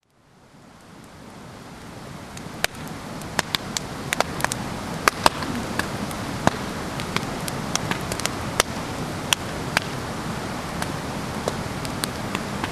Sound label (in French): feu